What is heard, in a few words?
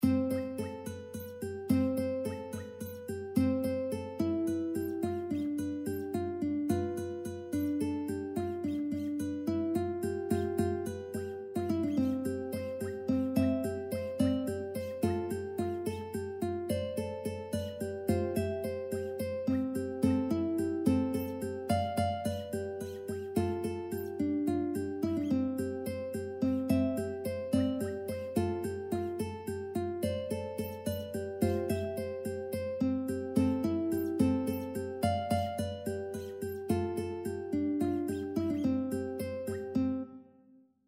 ukulele